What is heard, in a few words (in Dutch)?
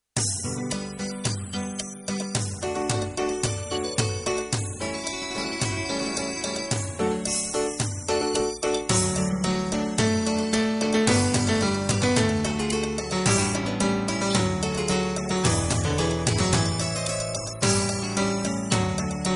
karaoke; regen hagel